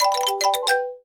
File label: attention 2 Sound; eldorado